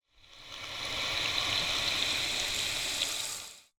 designed, skip fall, centering, high sizzle, bright; Eiravaein Works; Unfathomed; eternity drop